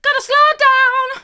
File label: gotta slow down hard, vocal